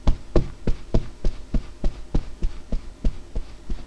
steps

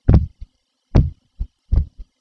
heavy land

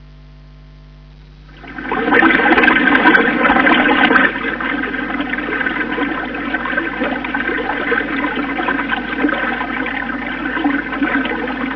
bubble rush

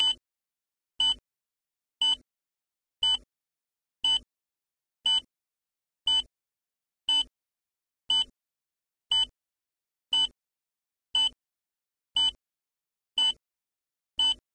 monitor beep

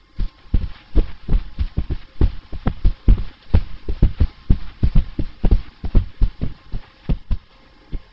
run and stop

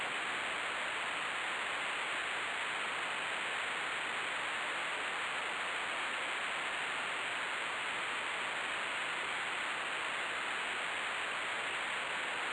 static

